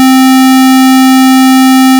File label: square wave